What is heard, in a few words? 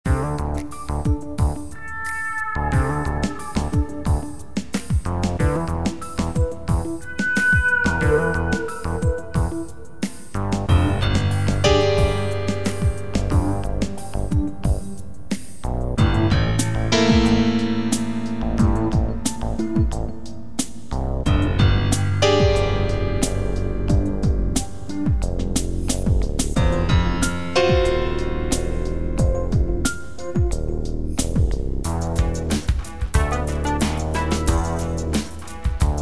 Grand Piano (loopable); Moby Dick's